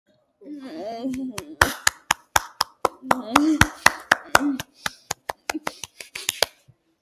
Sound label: clapping